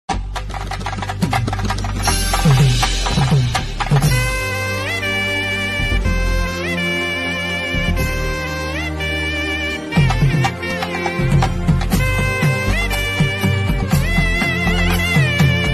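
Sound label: background music ringtone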